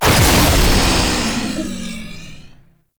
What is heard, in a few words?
Power Grid Button; Warframe; Duviri Murmur